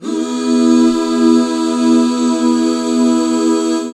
HAL E, BACK CHORDS, Roland; Sound & Vision - Gigapack II CD 1

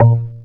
A#, Synclav FM PERCUSSION; Big Fish Audio

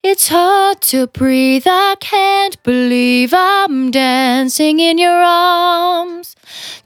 Finally 70bpm C, Velvet Vocal; Zero-G

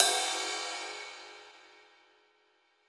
Pop Ride, cymbals; Roland BK-5

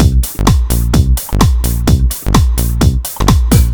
128 No Snr, 128bpm; Nu Dance Electro; Zero-G; Soundclash; Combi Loops; Monofonic

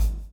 BLOW; Spectrasonics - Backbeat

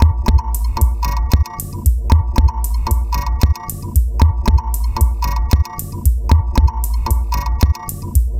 Echo Mbira Beat; Sonic Foundry (Sony Creative Software); Myths of Technology; Composite Beats

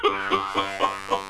wheel haha; Soundengine - CSR-000 The Art of Transwaves; MOVING PART

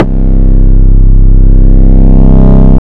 SY BUZZ; ModelCycles

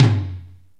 RX7 HEAV TM; Crypton - DrumBase5000

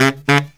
baryt, Killer Brass Riffs

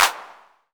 BOX CLAP, GM drum set; AKAI S-Series CD-ROM Sound Library VOL-8

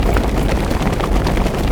HUM RUNIN, Foot & Mouth; Stompin'Feet; Roland LCDP14 Africa VOL-2

